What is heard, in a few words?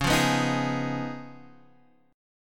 Db 9th Db down Guitar Half Step Down